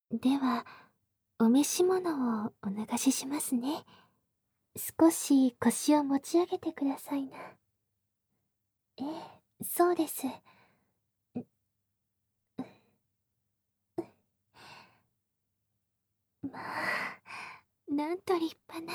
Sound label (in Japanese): 耳舐め手こき; 効果音なし